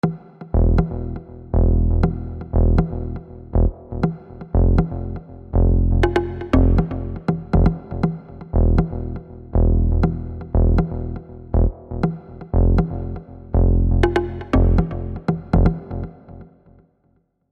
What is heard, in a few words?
RAGGA MAFIN precotion bass